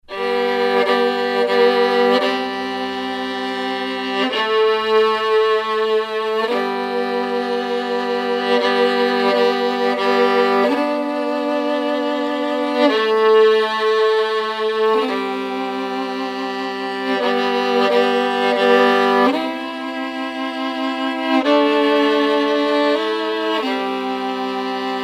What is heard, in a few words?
2 violins